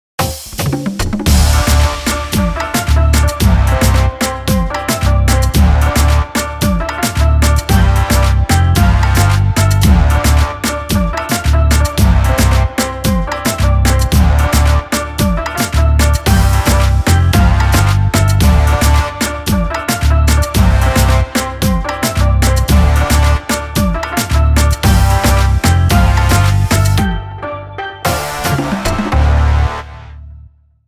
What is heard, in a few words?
dance hall riddims